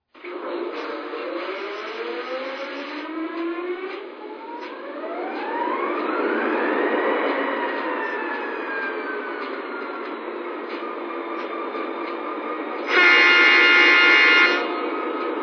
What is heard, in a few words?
SD40-2, electric trains